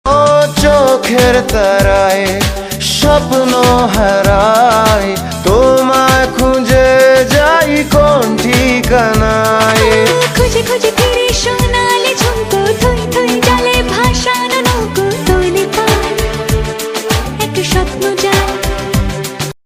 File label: bengali ringtone